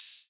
open hit

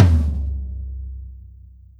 drum hit clap

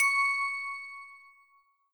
bell

